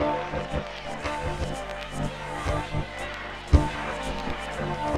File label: Chords Tones; Equipped Music; Loungin House